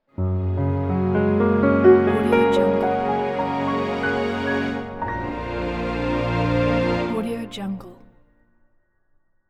cinematic grandpiano logo

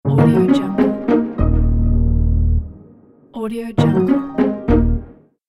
elegant acoustic soft logo

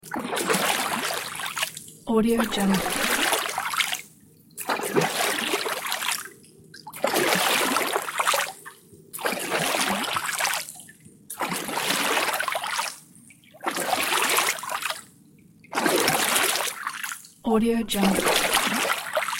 rowing

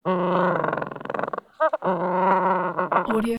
penguin gentoo sad long murmuring honks purring

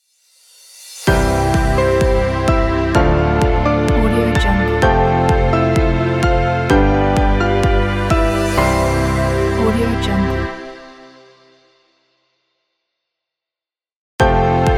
piano and string ident

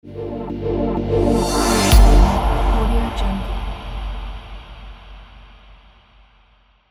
review outro logo

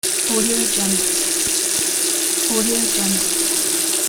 tap water running

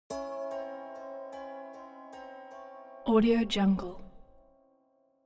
musical horror haunted strings tolling

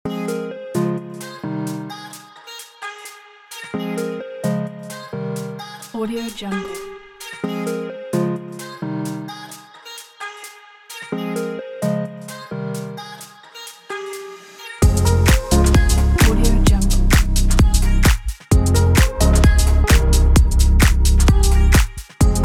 chill summer dance fashion house

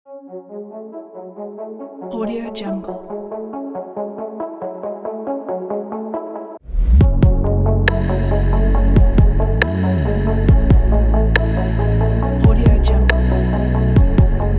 electronics tech

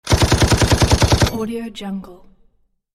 machine gun

